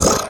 vox grunt misc, zombie

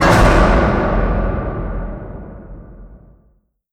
wakeup, elevator parking garage

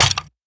weapon smg impact; physics

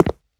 rubber, player footsteps

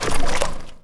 paint blob splat; Portal 2

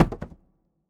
phys cube md; Portal 2

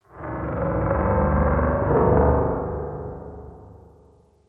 amb gen low metallic, ambient; Half Life Model Pack

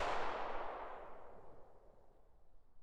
tail pistol shot, dry; weapons; Half Life Alyx

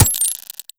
button success; combine

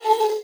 move, hinges drawers; tictac

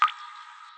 drip, ambient weather

